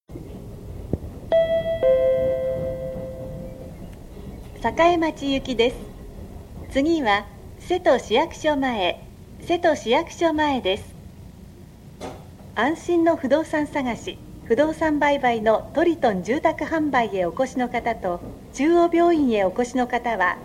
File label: train